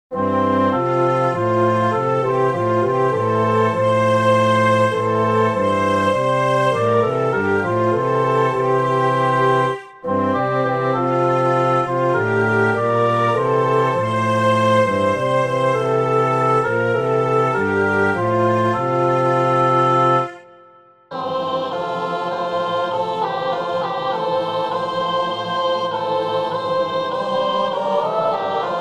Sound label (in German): psalm F dur